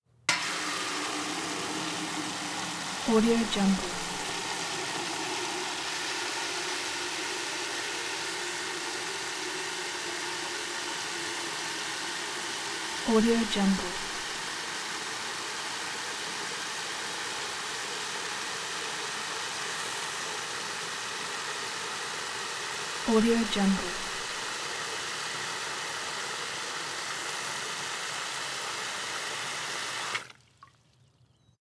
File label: fill bucket faucet on runs water spraying